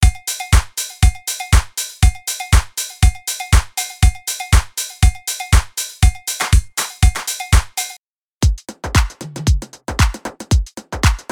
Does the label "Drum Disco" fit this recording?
Yes